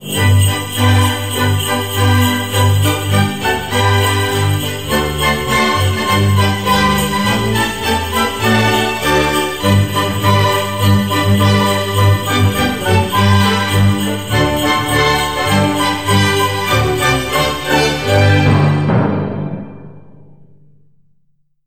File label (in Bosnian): Zvoncici kratka